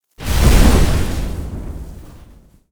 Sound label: imp spear